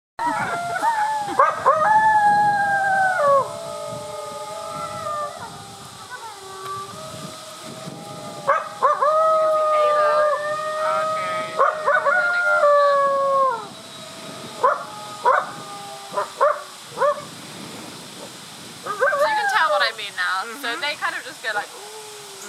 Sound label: howling; howl with the wolves; Road Trip 2013; On the guided tour around the park we also had the chance to actually howl with the wolves!